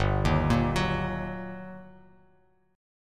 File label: G Minor Major 7th Sharp 5th, down, Bass Standard; GmM7#5 arpeggiated; JGuitar